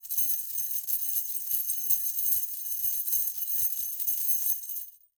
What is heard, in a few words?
Chain Jingle Rattle; Foley